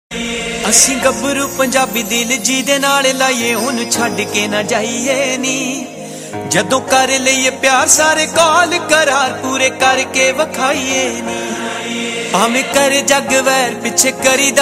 Punjabi Bhangra Tones; Ringtones

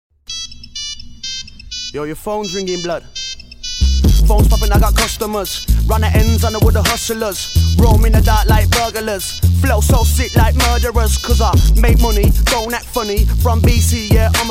RAP Old Ring Remix; Nokia; Ringtones

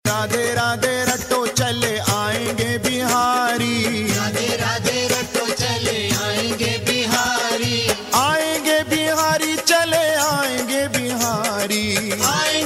bhajan tone; Navratri Special

